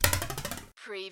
CARTOON RULER TWANG

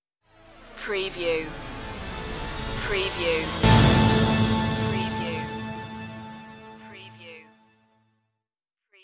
tv intense stinger

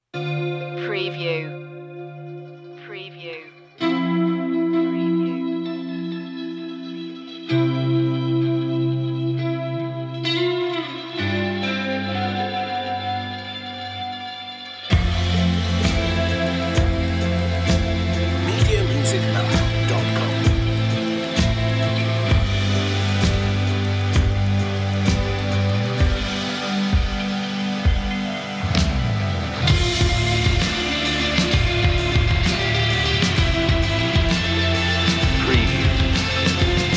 slow

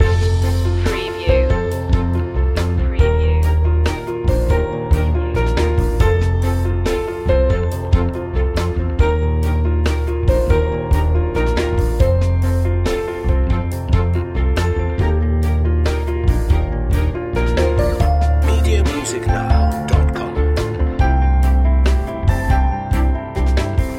acoustic mood loop

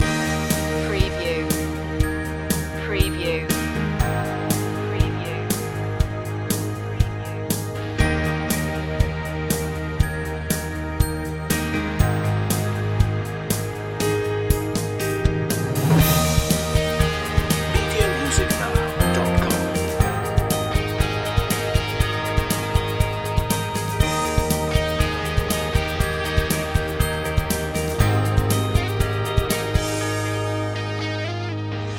loop

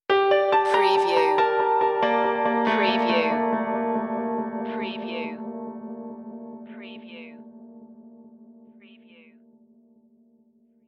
tranquilizer stinger

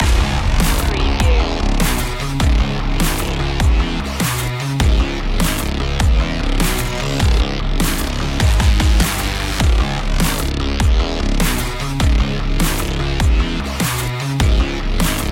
hybrid human loop

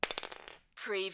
Lego, single lego piece drop on floor

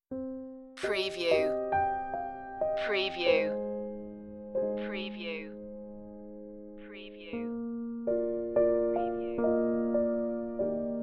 piano only

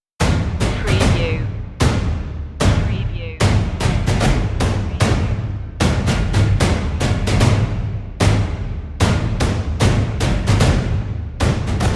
epic percussion less drums

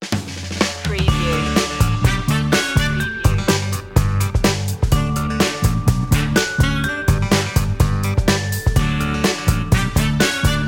happy groove intro

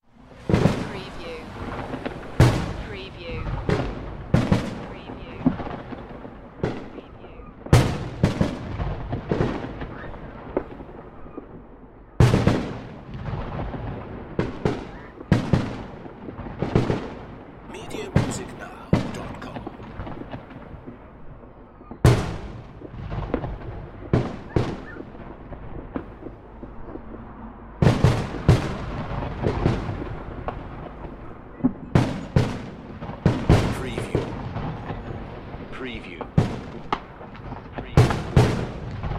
fireworks; beanstalk audio

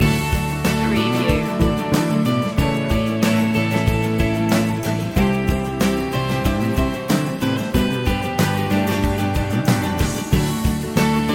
positive days loop

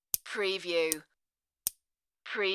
plastic clip snaps

